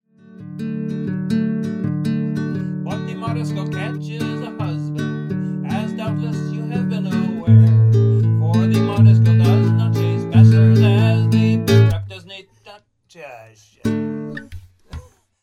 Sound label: Instrument Fig 8; recording separated voice guitar same time